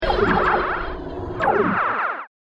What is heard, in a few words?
Starship Boarding Corridor Battle; Battle Sound Effects; science fiction sound effect